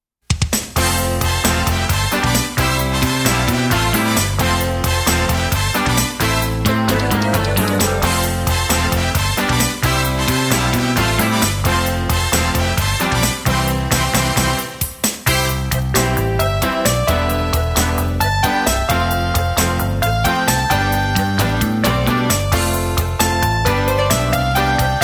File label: Karaoke